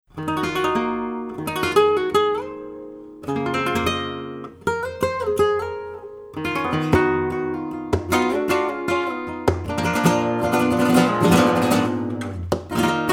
tangos, flamenco guitar; lliam christy

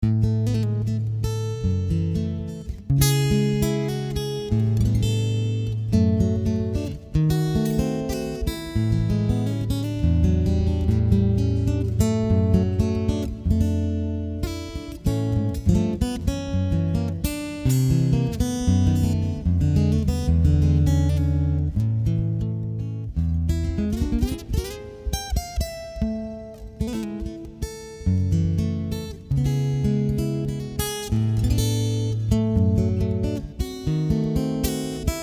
Solo Guitar; dance-band

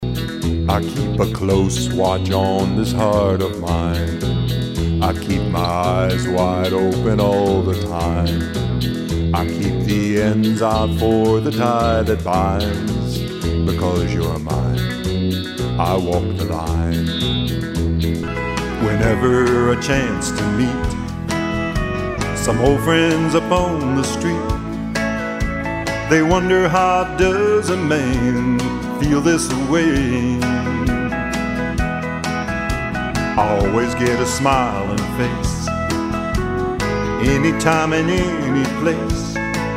Country Classics, cabaret singer

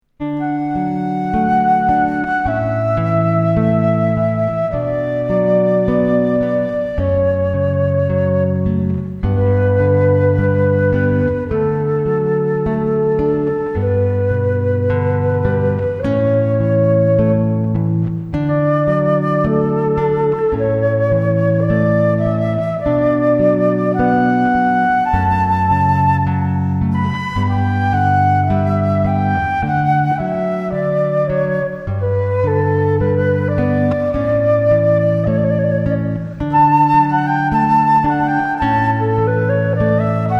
Canon in D Flute And Guitar Duo; Pachelbel

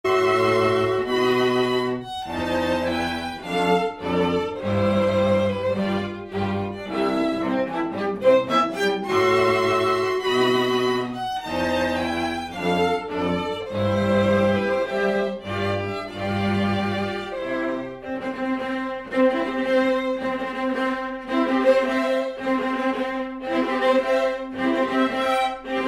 Wedding March, String Quartet; Mendelssohn

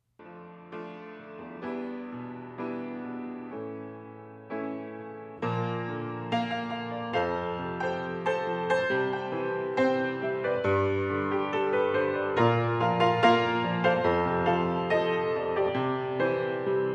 Piano

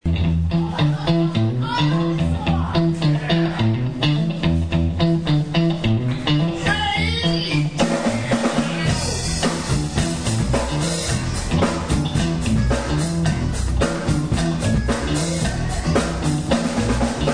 LIVE, Dance

